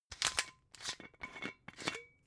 glass bottle massive open scratch

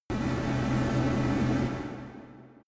space construction machine

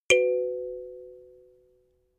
notification kalimba fourth

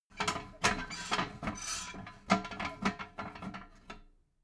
metal ladder small handle short

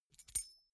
key ring small rattle air soft

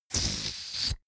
balloon air release fart airy

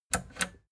plastic button latch click disable slow

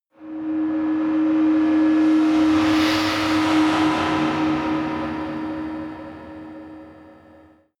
whoosh scifi hum

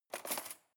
cutlery cake fork drawer grab hard

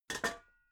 pots rattle soft

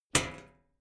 club hit sheet ring soft

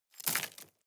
drop stones small

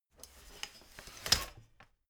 folding chair fold slow

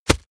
plastic tense bag quick

